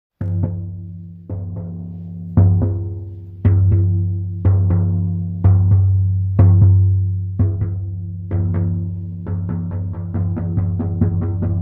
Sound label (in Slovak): samansky buben 50 cm; sleipnir a runy